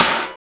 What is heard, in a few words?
SNR SWING, Drums; AMG; Now CD-ROM (Roland)